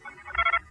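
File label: screen display, computer; federation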